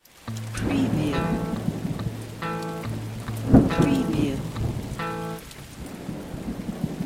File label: Rhythm 3-4 A5 140bpm Clean, electric guitar; RTM 3-4 A5 140bpm